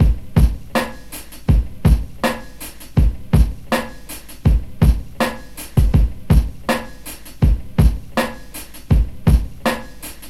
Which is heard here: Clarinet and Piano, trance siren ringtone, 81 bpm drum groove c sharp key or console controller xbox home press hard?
81 bpm drum groove c sharp key